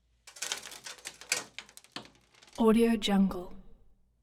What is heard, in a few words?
rust crumbles